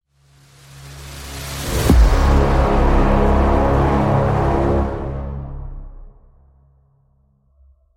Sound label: Awesome Cinematic Intro